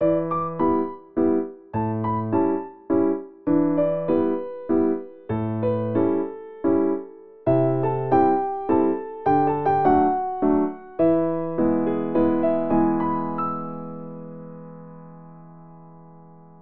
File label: Piano; The Legend of Zelda Ocarina of Time